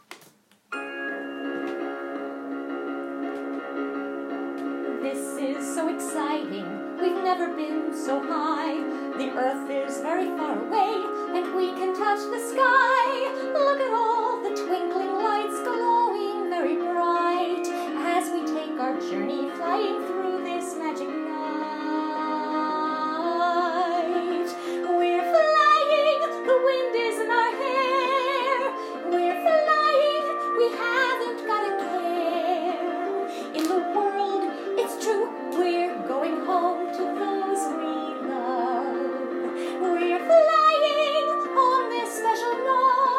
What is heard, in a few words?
vocals